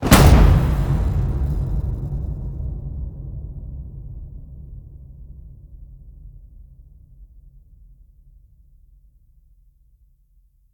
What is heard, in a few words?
HIT Fire, Cinematic Hits Designed